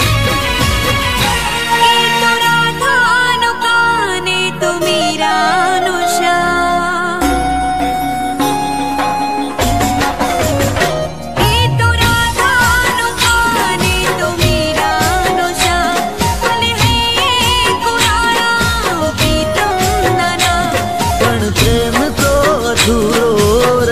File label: Gujarati Love Song Ringtone